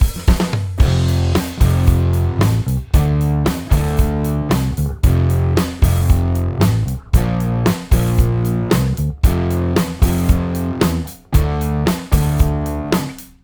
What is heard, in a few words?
Groove Buss After